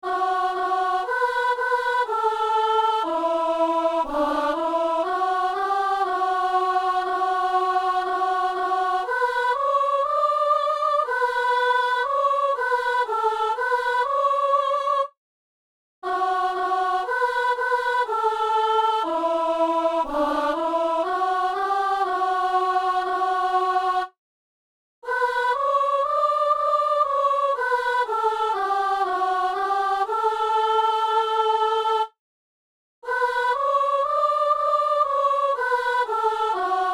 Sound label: soprano